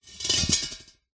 industrial gas canister metal movement scrape on gritty concrete surface